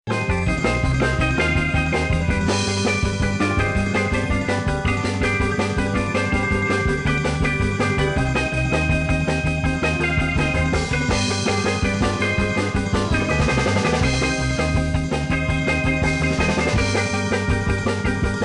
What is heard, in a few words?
rock, surf rock with organ and claps; suno